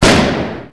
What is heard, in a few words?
Bang Loud Hollow